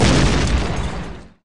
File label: Explo Small; Destruction